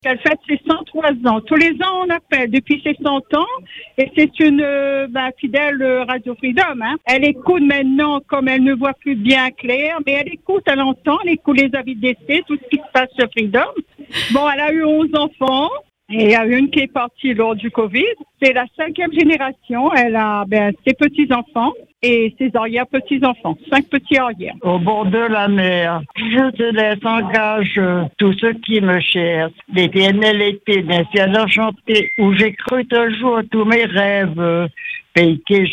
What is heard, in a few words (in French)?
joyeux anniversaire chant; fete 103 ans aujourd'hui